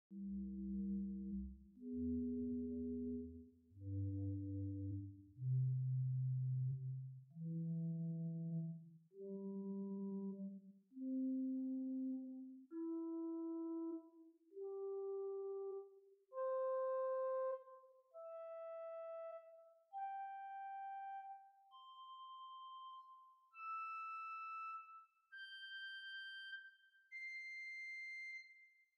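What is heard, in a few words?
Great Dulciana arpeggio, organ; Prudhoe Methodist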